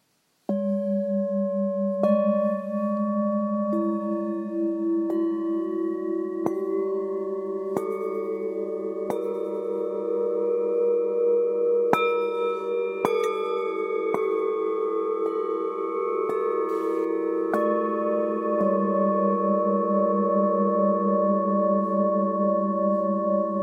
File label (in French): bols bengale anciens; mix